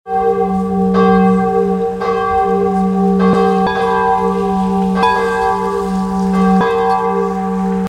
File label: church bells